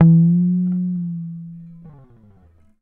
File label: GTR TRIK, Jazzistic; Univers Sons